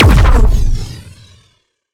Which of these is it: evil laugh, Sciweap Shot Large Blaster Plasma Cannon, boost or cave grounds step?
Sciweap Shot Large Blaster Plasma Cannon